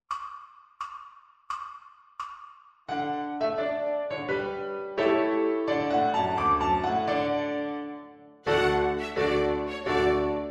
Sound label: Violin Piano Backing Track; ViolinSchool